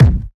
rich low end steel kick drum c key

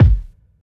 phat kick drum single hit g key; kick drum samples

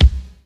kickdrum sample c key

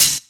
sharp hi hat closed sound g sharp key

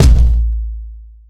present steel kick drum a key

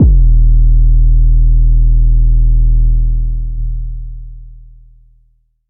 phonk kicks oneshot 808 E, nasty, layered 808; kick drum

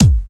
clean kick drum sample a sharp key

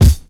shiny kick one shot c sharp key

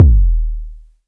big bass drum d sharp key; kick drum samples; one shots

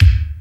studio kick drum d key

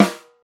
sharp snare sound g key; snare drum samples

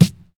nice steel snare drum sound f key; snare-drum-samples

